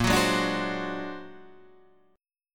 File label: A sharp Augmented 9th A sharp Guitar Half Step Down